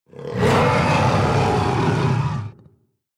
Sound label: dragon roar; time-lab